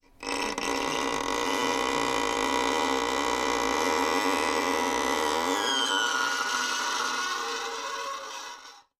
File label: Creaky Screetchy Noise Long; soundscrate